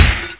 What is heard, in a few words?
chain imp; rashdemo